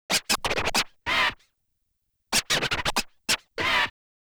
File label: Scratch